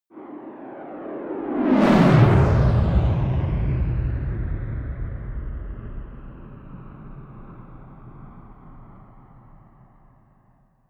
rocket sidewinder flyby whoosh fast close

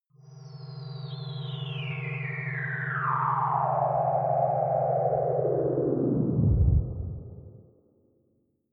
ufo approach

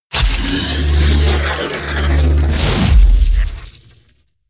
wind up

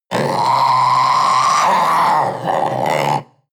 panther young roar long

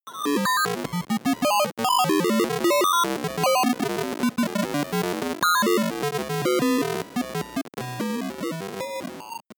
broken computer trill

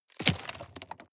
drop stones large

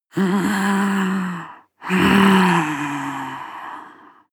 breath female moaning breath angry

